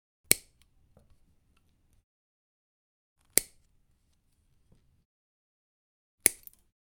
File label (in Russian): Kusachki